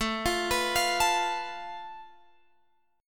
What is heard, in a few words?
A Suspended 2nd Sharp 5th, Guitar, Drop D